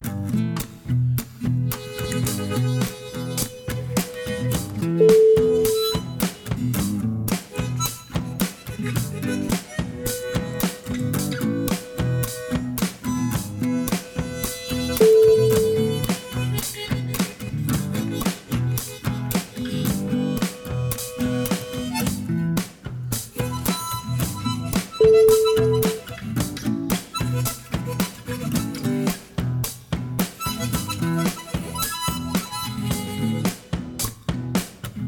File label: deep southern blues